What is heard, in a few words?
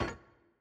Stuck Bolt; Puzzle; Monkey